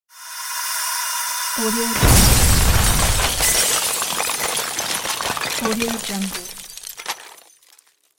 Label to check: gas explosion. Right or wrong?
Right